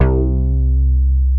BASS BANK, R; Sound Gallery - Charged

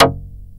TURBO ACID

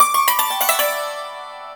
YANG CHING, Heart of Asia; Spectrasonics

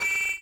MET SW SIN, SWORDSING

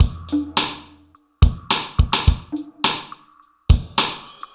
Drums

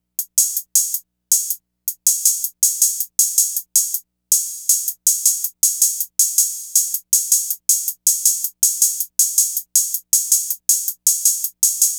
Hi Hat

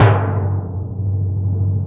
Orchestral Perc, Timp FX; Roland LCDP03; Ambo TimpRol

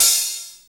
HH, REAL

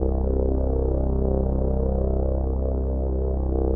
505, String Machines

